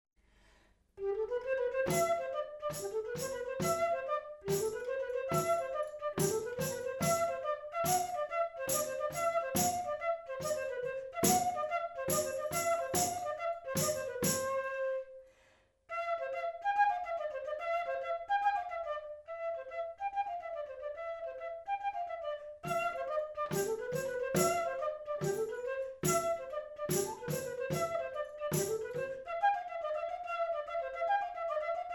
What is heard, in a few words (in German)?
klangprobe, flautino traverso